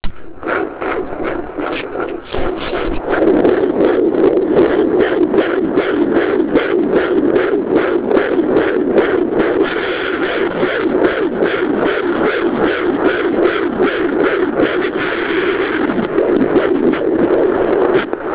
baby heartbeat

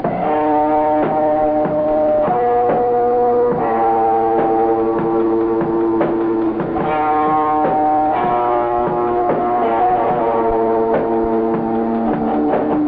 Riff

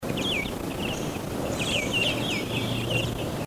Brown Bullfinch, birdcall

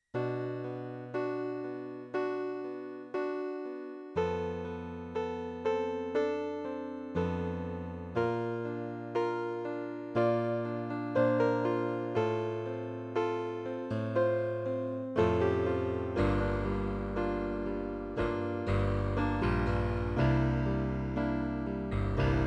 Solo Piano

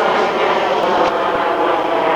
jet sound

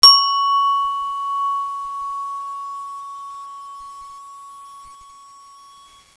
chime

